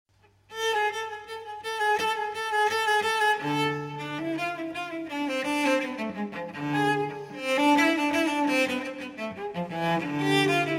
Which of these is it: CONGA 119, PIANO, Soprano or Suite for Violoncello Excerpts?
Suite for Violoncello Excerpts